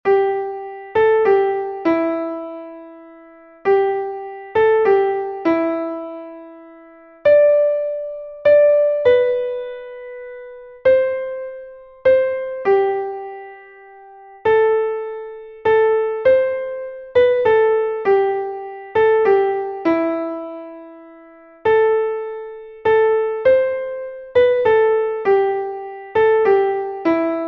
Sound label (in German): klavier; stille nacht heilige nacht; melodiemeister